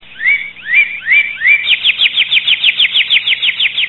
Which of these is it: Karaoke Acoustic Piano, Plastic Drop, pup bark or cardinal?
cardinal